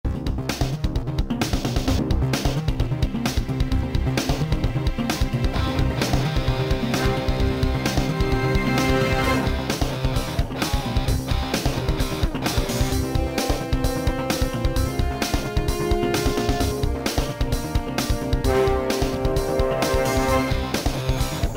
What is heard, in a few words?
race; Transformers Animated: The Game; DS